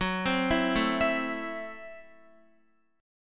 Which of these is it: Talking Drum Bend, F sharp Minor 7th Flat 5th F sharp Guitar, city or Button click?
F sharp Minor 7th Flat 5th F sharp Guitar